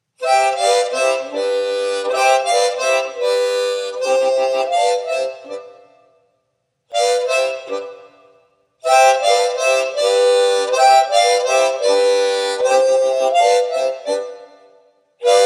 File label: orchest hall